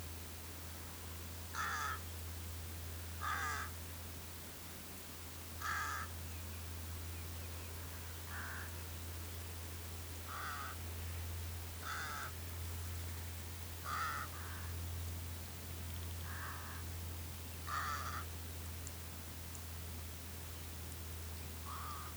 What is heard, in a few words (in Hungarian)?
vetesi varju hangja vetesi varju Corvus frugilegus